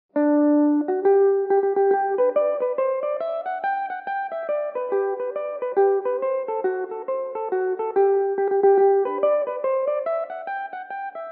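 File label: hornpipe G major, Irish mandolin; The Worcester Hornpipe; Belmuse EM-190 electric mandolin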